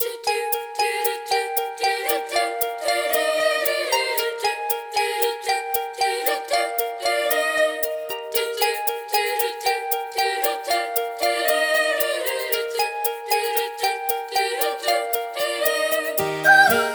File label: Chopstick Waltz; LG isai LGL22